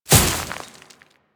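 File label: Step Vegetation, Footsteps; Warframe; Grineer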